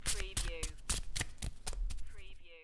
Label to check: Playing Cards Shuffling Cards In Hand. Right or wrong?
Right